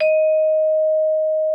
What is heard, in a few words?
VIBF D#5, VIBES; Xsample VOL-4